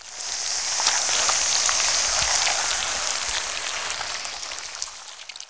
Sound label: medusa idle; DAoC; Toa; Gamestar